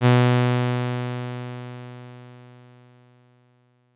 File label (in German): Saite c 415Hz, Leise Lauten; Chor 5 (cc‘); (Saiten 8 und 9); Stimmton: c